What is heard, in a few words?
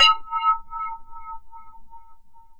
GLASS, household; AKAI Anime Sound FX